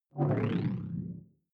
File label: sci-fi alien big bionics; swooshes-swishes